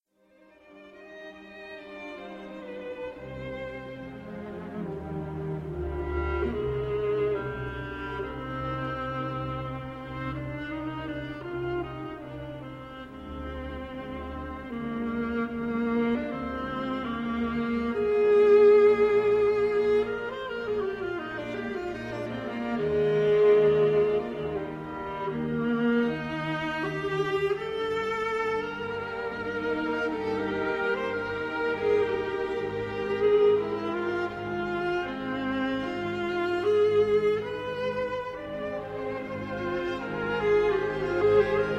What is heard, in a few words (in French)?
Symphonie concertante pour violon et alto